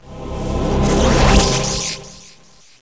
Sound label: Magic